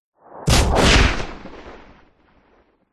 sound design hit whoosh into punchy crunch hit with a rustling tail; zapsplat